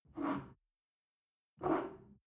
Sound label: shell grab from table, gastronomie; berufe; soundpool